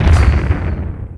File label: Chain blast; ImpGal2; spacewar